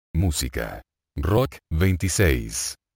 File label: rock